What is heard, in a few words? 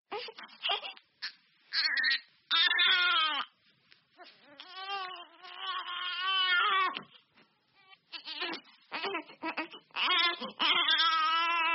Nursery Baby Crying